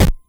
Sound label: move, ATARI